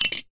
ancient game metal object unlock pop close gear open slide texture